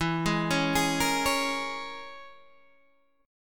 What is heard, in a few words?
E 6th Flat 5th E down Guitar Open D